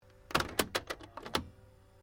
Stereo Akai Mini Tape Deck Stop, Multi function music player; Tape Players; Electronics